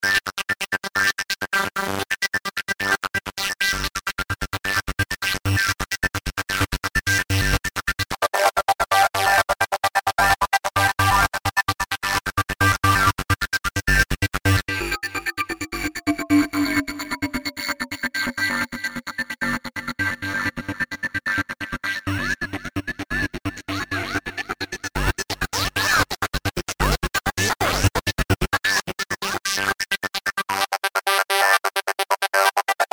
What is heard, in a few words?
Gnar Lee Arp